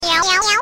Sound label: cat trill